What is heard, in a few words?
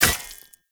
Short Sword Throw Medium Impact Rock; Player